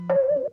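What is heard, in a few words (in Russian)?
глухой звук щелчка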